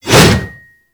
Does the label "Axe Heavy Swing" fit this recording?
Yes